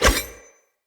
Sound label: Dagger Melee Whoosh; Weapons; Player; Swords